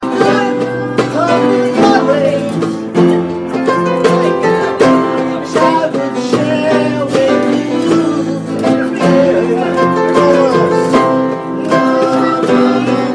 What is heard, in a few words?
new year sing song